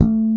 finger bass; AKAI S6000 CD-ROM - Volume 1

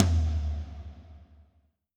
Tom Lo Wet, Alesis D4

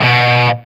C 4, Soldano rock guitar; Spectrasonics; Hans Zimmer Guitars VOL-1

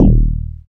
BAS FM DX-7

